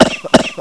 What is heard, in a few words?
death; JOSEPHIN